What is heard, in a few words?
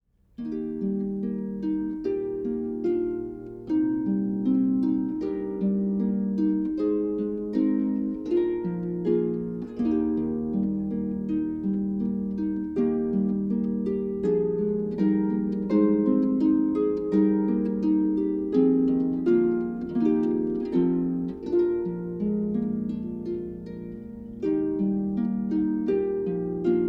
Harp Selections